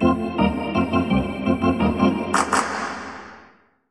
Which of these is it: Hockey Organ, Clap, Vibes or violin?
Hockey Organ